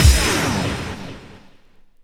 BANGS; AMG Megabass REMIX! VOL-2

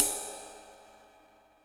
Ride Dark, Yamaha DTXpress Cymbal

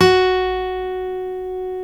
STEEL F#4, FOLK STEEL Guitars; Spectrasonics; Hans Zimmer Guitars VOL-1

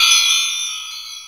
CHIME FX

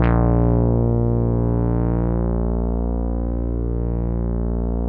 A#1 ANA BS, synth bass

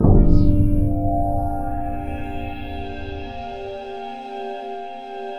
ICICLES L; NorthStar - The Wizard VOL-2; DRY ICE

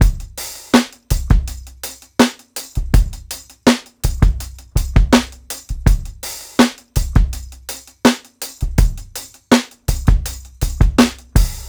82 16TH BEAT, DRUMS LOOPS; BASEMENT DRUMS VOL 2

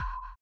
SONAR R; Spectrasonics; Metamorphosis

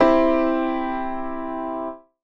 St Grand Piano Chord, Old Skool House; Big Fish Audio; OSH Chords

